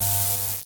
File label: elec